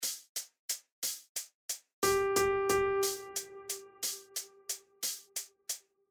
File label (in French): Croches Ternaire